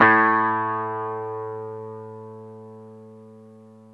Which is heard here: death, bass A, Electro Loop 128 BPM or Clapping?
bass A